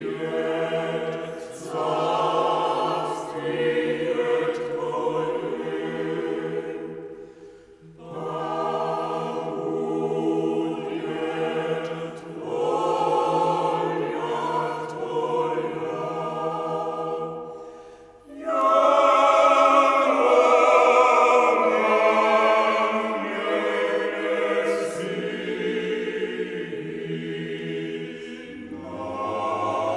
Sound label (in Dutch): Koor gemengd